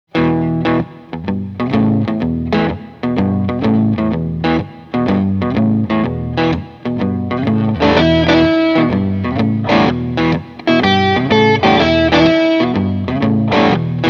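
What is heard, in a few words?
strat heavy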